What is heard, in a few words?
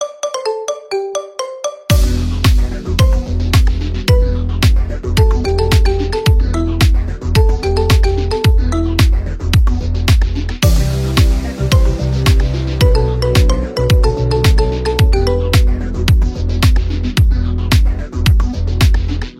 Marimba Remix